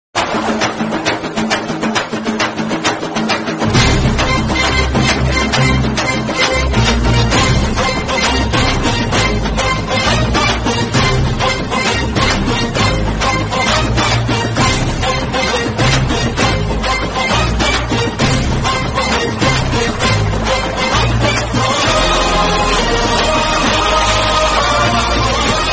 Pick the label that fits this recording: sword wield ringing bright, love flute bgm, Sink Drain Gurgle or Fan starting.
love flute bgm